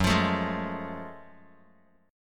F sharp Minor Major 9th, Bass Standard; strummed; 14,20,19,13; down

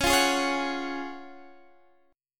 F sharp Major 7th Suspended 2nd C sharp

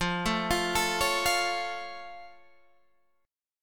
F Augmented F Guitar Open G

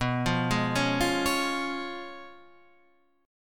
Db 7th Cb, guitar; Db7/Cb arpeggiated; JGuitar

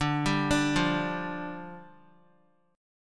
D Minor Double Flat 5th, down, Guitar

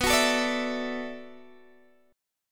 B Minor 11th B down Guitar Open G

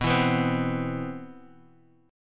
C Major 7th, B, guitar chord

